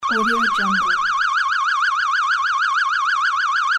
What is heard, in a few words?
car alarm